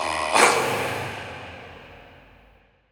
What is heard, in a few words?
Processed Battle Shouts, Viking Vocal